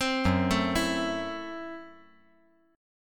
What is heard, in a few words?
Gb Minor 7th Flat 5th